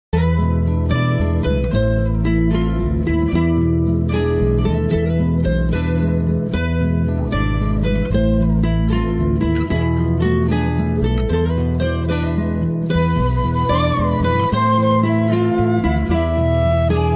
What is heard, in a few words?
bgm ringtone; phoenix